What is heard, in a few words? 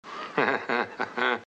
laughter